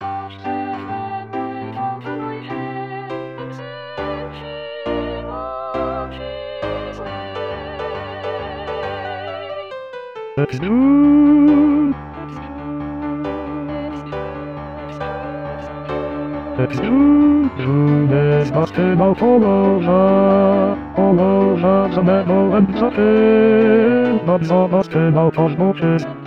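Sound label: I'm gonna wash, Bass; Oklahoma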